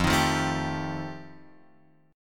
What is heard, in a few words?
F 9th F Guitar Open F, chord; strummed; JGuitar